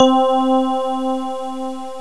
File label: Electric Piano; Eartraining 2.3